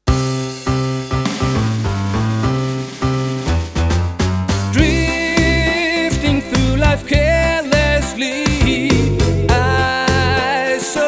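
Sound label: ukulele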